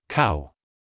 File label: cow